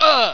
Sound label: splat; zombieii